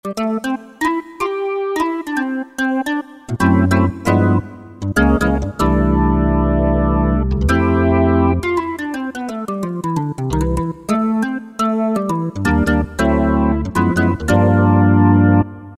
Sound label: BX3 Medium, Korg N364; Factory Prog demos